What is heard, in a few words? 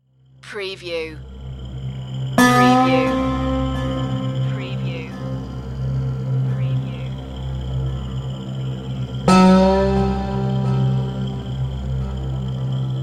dark swamp